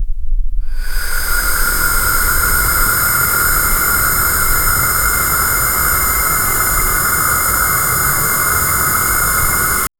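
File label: faint hiss of steam rising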